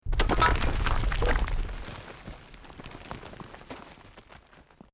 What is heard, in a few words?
ROCK DIRT SLIDE SMALL; Created dramatic effects of buildings collapsing or breaking; Rock Sound Effects; Materials